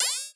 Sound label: Amulet Equip; Live Realm